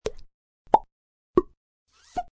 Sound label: Mouth FX Pops, Mouth effects, pops & plops (human voice)